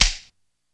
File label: Gun Dry Fire